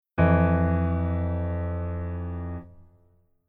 Keyboard Voicing Basic

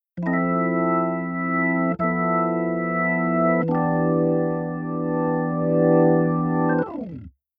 Keyboard Org

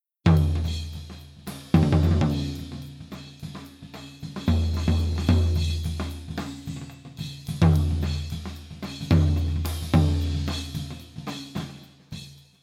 Drums Tom F Tom Comp On

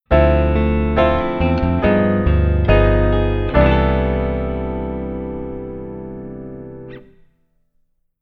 Keyboard Voicing Rootless

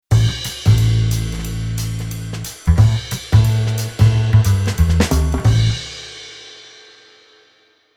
Bass Arr Jazz

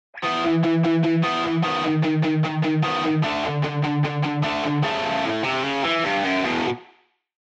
guitar pattern 2 gt

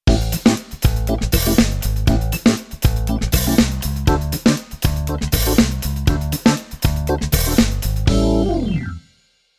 Keyboard Organ Funk

